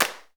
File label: PRC XCLAP, Hand Claps